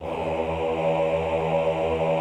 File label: AHH E1, Classical Choir; Best Service; Extended Classical Choir